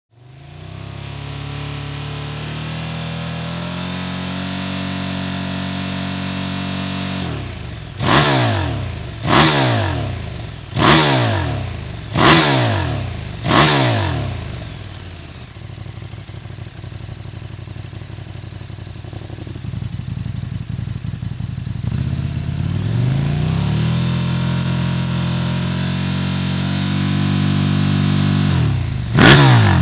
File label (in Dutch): jekill-hyde sound, uitlaatsysteem ktm 1290 super duke gt